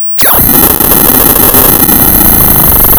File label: pop, tearing paper